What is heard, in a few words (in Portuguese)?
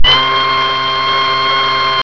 sirene